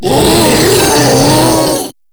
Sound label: Proto Infested Ancient Vocal Attack; Warframe